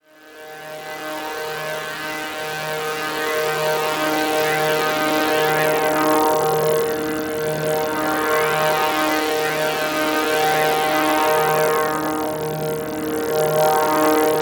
MODULATED DRONE, DEEP ATMOSPHERICS; Sonic Foundry (Sony Creative Software); Vir Unis Infusion

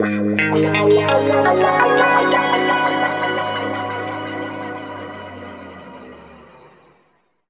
G# P-S WA -L, Guitar Samples; East West; Steve Stevens Guitar Samples Collection; PTCH-SHFT+WA

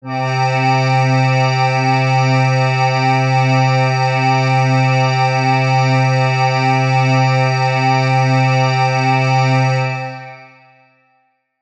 PAD (C), Pads & Atmospherics; neuro; Stanza

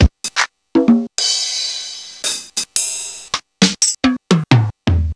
Technics PCM DP50

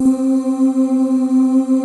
LIGHT OOHS, pads; Pro-Rec - Synthsonic

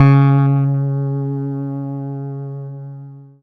C#, Synclav FM PLUCKED; Big Fish Audio

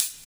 Open Hhat, HiHat; Equipped Music; Loungin House

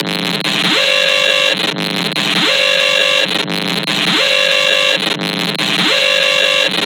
classic wub step, bass

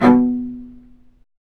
Low Marcato; Roland L-CD702; Combos 1

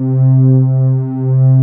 JP ANA PADS; Pro-Rec - Synthsonic; JV ANALOG1

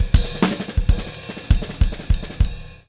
DOODLE, Drum; Steve Gadd Drumscores; GEMS; LICK GEMS

